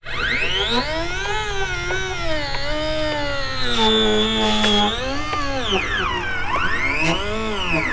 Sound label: servos and robotics; sound ideas